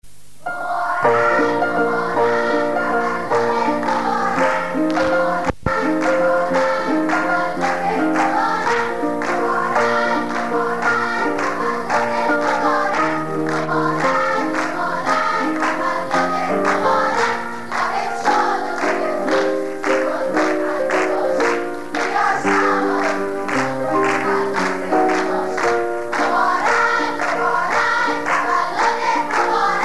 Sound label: Tavalod (Choir)